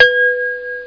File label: bell